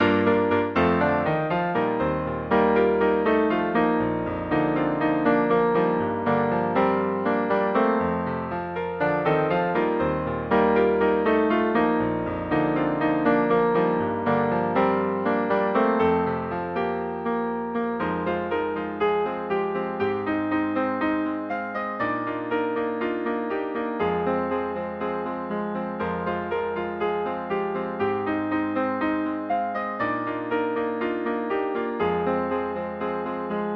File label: sample payphone telephone